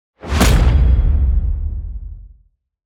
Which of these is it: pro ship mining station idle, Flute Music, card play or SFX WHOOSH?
card play